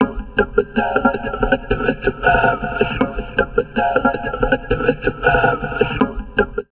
VOCOD, Drum Loops Crazy Processed

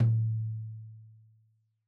Tom2 Stereo, Deeply Sampled Drums; SMDrum

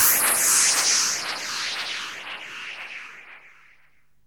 noise fx; Masterbits; Switch FX

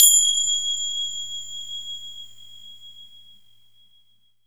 FINGER CYM

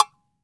AGO SQUEEZE, Brazil; AKAI S6000 CD-ROM - Volume 5

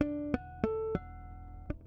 Harmonic Riff, Bass; Construction Kit; Riffs, Patterns Licks; Bass X

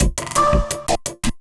LOOP, Drum & Bass, 170 BPM

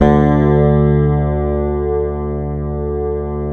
Fingered bass L; Sampleheads; Will Lee Bass Library

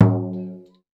BASH, drums; Spectrasonics; Supreme Beats

